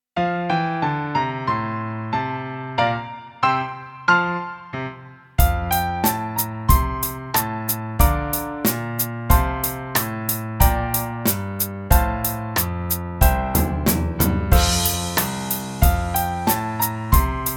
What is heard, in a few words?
Running 16 notes